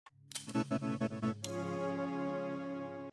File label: electric hot dog; electric shaver